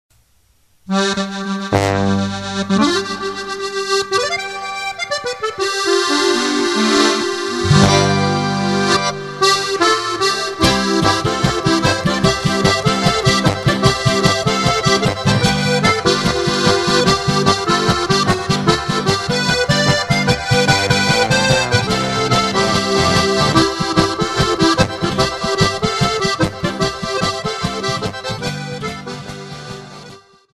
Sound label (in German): polka